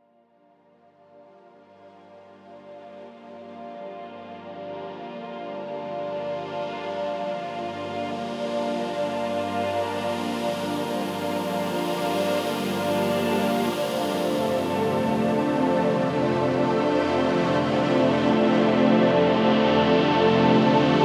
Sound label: Relaxing Music Sleep Sound Spa